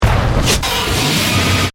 Stinger Burst; Gltich, Stutter, Production Element, Imaging Element, Accent, Transition; BlastWave

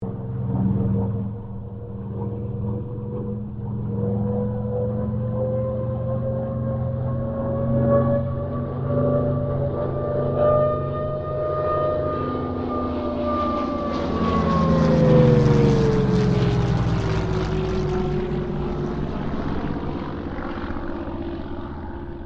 Kittyhawk Boomerang pass, Airplane; Mixed aircraft squadrons

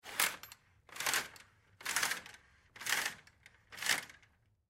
Curtain Slide Open, Curtain Slide Open Slow; Windows; Household; Blastwave

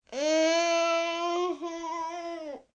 Moan Muffled, Moan, Groan, 1 child, whine; Groaning Sound Effects

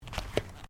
FOOTSTEPS THONGS (FLIPFLOPS) CARPET STEP; Footstep Foley; Footsteps (Sound Effects:Human)

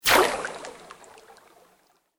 Sweep Organic Fast

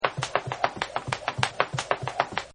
Jumping Rope; Miscellaneous Sport Sound Effects

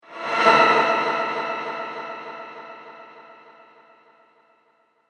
Horror Sweep With Echo; Whoosh Sound Effects; Andrew Fleming; Twistedtracks

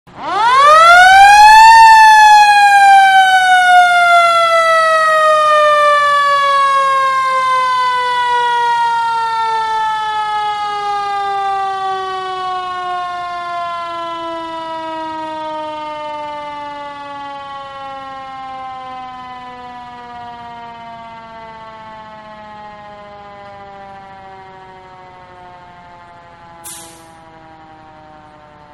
Fire Engine Siren Static View; Emergency Vehicles; Sound Effects:Vehicles; Manhattan Production Music

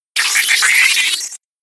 Space Zoom, whoosh; sound design

